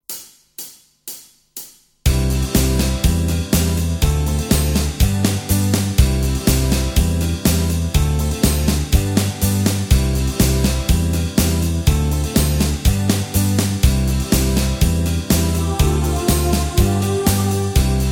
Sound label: (C To Db) No Lead Guitar